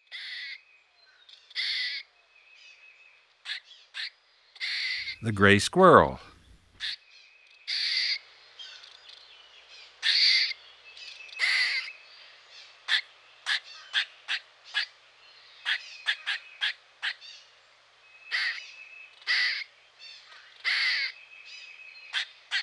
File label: Grey Squirrel; Eastern North America; Dan Gibson; Wildlife Identification By Sound